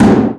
All Explosion

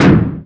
Torso Twist Stop

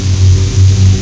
Laser A Sustain

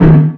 Collision

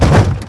Destroyed Int

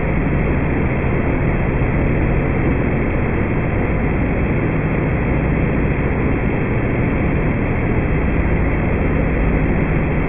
Pipe Machine

